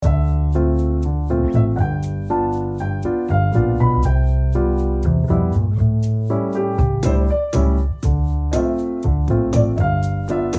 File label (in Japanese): ティータイムLoop～ピアノストリングス落ち着くゆったり作業用勉強用まったり～; にゃるぱかBGM工房; chill